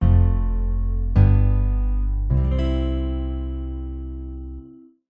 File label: multimedia signature ident warm sincere piano guitar bass short; zapsplat